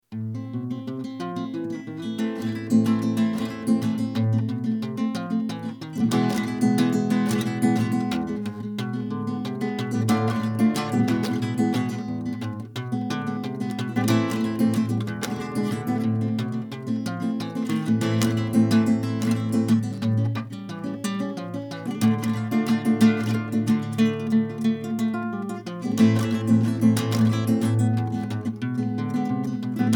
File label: Spanish